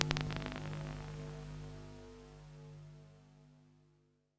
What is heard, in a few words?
Chord Hum